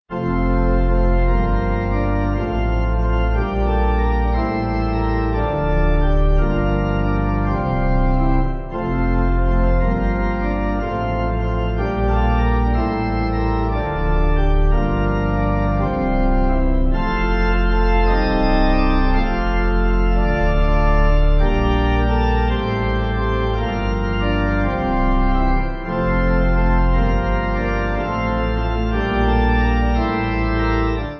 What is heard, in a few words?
Pipe